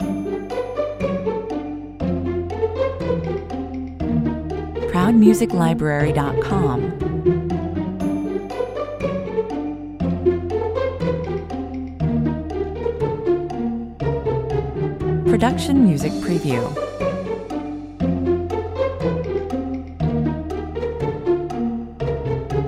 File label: (no lead)